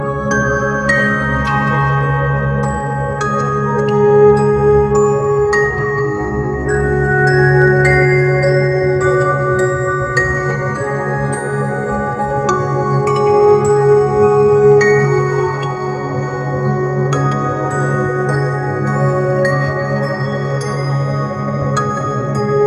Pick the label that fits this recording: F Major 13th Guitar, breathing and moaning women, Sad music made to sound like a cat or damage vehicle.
Sad music made to sound like a cat